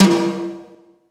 Big Conga; Zero-G Sound Sense Grime Scene; GS Kits

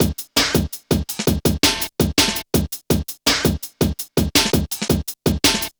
Klanga, drum loops 165.5; Jungle; Zero-G Jungle Warfare 1, 2 & 3; 90 s Sample CDs

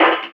SD, Drum & Bass, 135 BPM; Ueberschall; Drum & Bass Flash Trackz

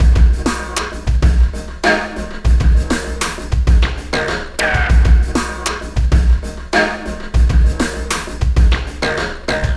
Sky Lab Beat, Processed Drumkits

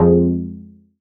stab slide, stabs

